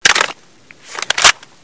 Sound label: generic reload, weapons; cstrike; DEX